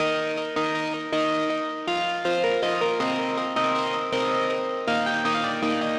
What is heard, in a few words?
Keys Part Processed, hyperpop beat